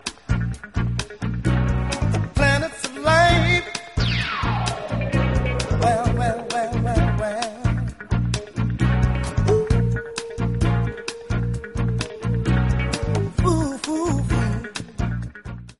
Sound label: whispers planets; planetz